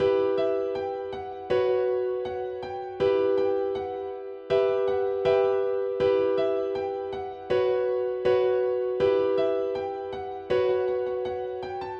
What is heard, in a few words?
lo-fi ambient piano glide loop 80bpm E minor